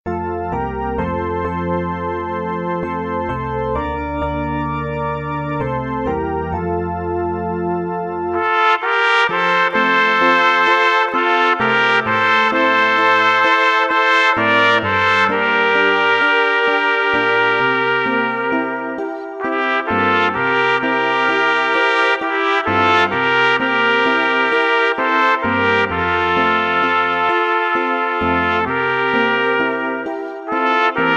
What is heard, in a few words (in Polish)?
2 trabki; gdy sie lacza rece dwie